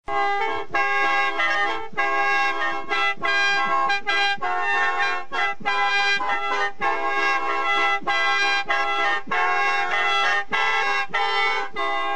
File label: Sompoton Ringtone